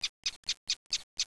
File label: bird; XDM; ambience